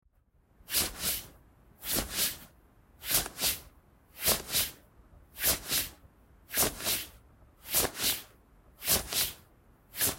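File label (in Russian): звук движущейся ткани предназначен для случаев когда ткань движется в сцене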